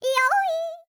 YODEL, World Voices

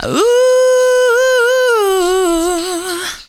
ad lib oooh, female vocals; PlatinumPhattCD2; E-MU Producer Series Vol. 8 – Platinum Phatt

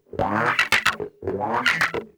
Wah; Best Service Gigapack 2

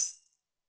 shaker, Zoom MRT-3B

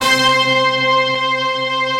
C5, STACC ORCH; Best Service; Advanced Media Trax Modern Composer

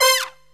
SYNTH GENERAL; Megabass Remix! 2

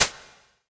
Shaker, percussion; DRY; MARKYDRUMS

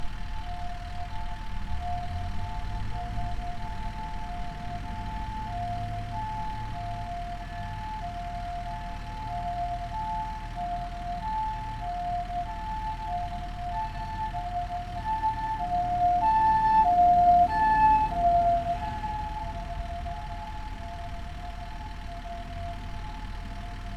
Alarm, Siren, Ambulan; City Sounds

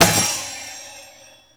drop, percussive

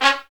BRS TP, Roland, Stabs; Roland L-CDX-03

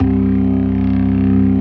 24 STOPS, Synth and Organ; InVision Interactive; Keith Emerson; ALL STOPS 1

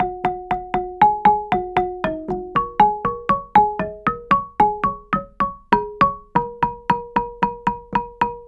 GAMBANG PH; Spectrasonics; Heart of Asia

